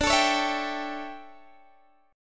D Major 7th Suspended 2nd Suspended 4th D, Guitar, Open G